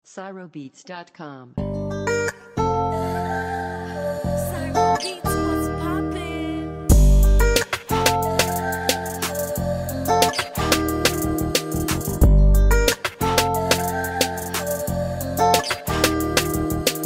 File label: Trap RapTrap Instrumental